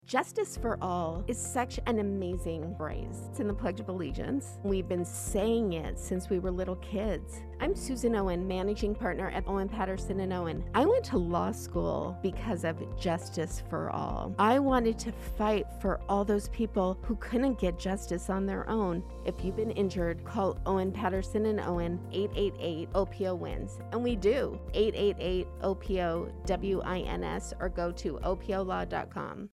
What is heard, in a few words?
music bed